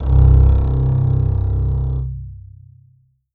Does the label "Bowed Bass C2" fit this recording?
Yes